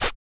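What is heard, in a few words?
PERC SHAKER, MALE PERC; Spectrasonics; Vocal Planet; Jazz FX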